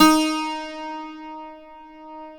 BOUZOUKI D#3; AKAI S6000 CD-ROM - WorldSounds

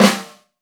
FLAM, 30S JAZZ; Northstar - New Gold VOL-1

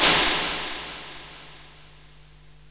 Snare, Boss DR-660, Drum Machines

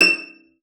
CELLO FINGER; 90 s Sample CDs; Xsample VOL-5

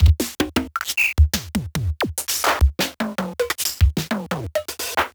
Cheetah SpecDrum

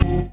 Stabs, Elektron MachineDrum; SPS1 MKII

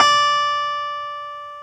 KEY X Hohner Clavinet

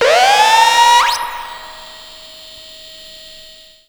Synth Wail; Sonic Foundry (Sony Creative Software); Electronic Point Blank

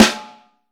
Vintage Snare Drum, 3.5X14

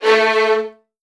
A1, Strings, VN 16TH; ILIO; Kirk Hunter

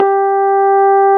SUIT AMP G3, Keyboards Old School; USB Soundscan vol.09; AKAI

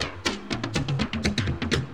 Dance Grooves

